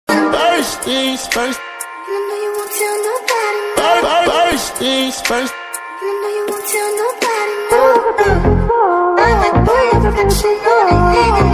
Remix Ringtone

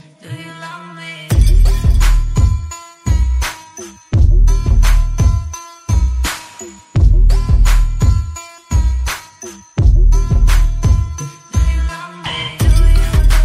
Bass Boosted

